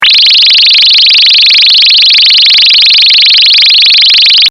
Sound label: fm synths